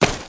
突击步枪; 武器音效

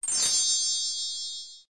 制作完成

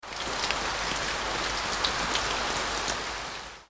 下雨声

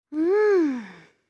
女啊声

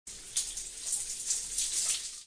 洗澡

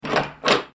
开门

